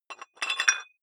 dumbbell thread put on small weight quick, fitnessstudio